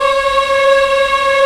VOX AFMM, VOX Afro Mms, Africa

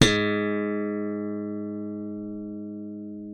G#2, slap thumb; Xsample VOL-1

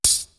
CRASHER; East West; Global Sounds

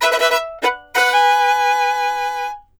FIDDLE; Sampleheads; Whole Lotta Country 1 & 2; FIDDLE LP120

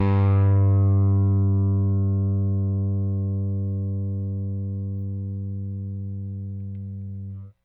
RickThumb-C, RickenbackerBass

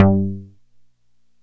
JUNO SEQ, Juno Synths; E-MU Formula 4000 Series Vol. 3; Analog Odyssey

